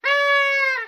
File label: Horns 1, Various Party / Bike Horns, Short.